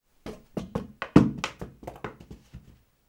impact shoe falling internal stairs; sound effects one

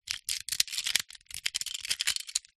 leisure toy cars metal x3 handling

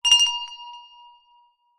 bell med small school hand ring short

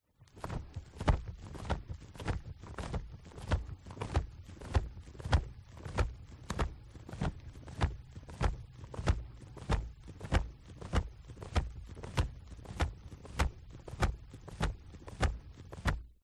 BIRD Wing Flap Leather Strong; ANIMAL; smartsound

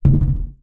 balloon inflated hit surface bounce fast; foley; zapsplat

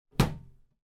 household bathroom cabinet door close

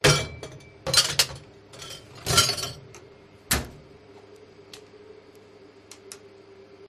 pub glasses put in glass washer machine; field reports

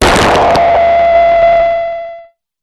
Glitch Decimated; Glitch Factory 01; sound spark

